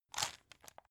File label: pepper mill soft; gastronomie; soundpool